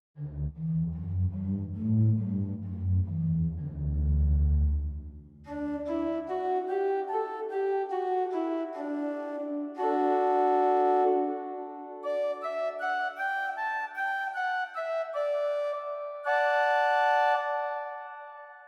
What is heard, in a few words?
Praestant 8; Zwolle